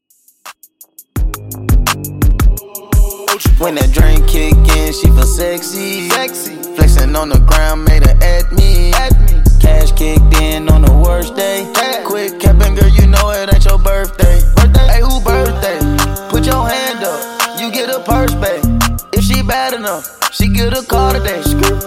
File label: 85 Bpm; Clean Dirty